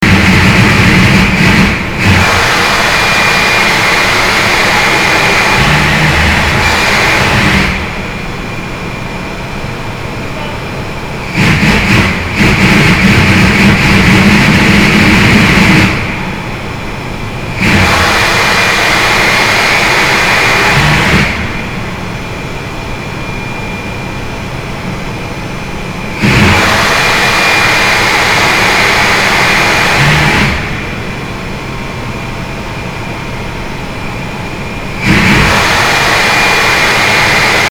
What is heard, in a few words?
Surge Sound